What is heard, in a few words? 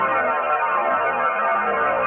Tractor